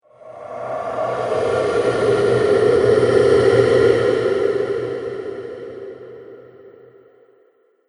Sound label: Pyramid Phantom, ghost sound effects